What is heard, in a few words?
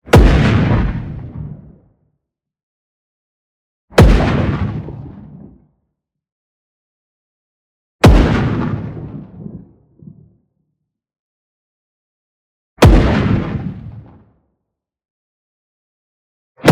EXPLOSION SHORT Skyquake; boom library; Anime Essentials